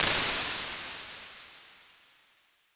right glass triangle; IR

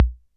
kick accent, CR-78

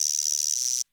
guiro long, CR-78

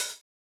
hihat open; Detroit

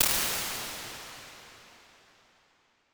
conic long echo hall; IR

